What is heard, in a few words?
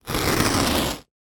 paper rip